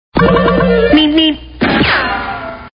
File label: Meep Meep, cartoon; Tesla lock sounds; Road Runner